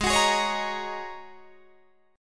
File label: G sharp 9th Suspended 4th, Guitar, Open D; down; chord shape